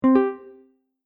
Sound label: Correct Double Ding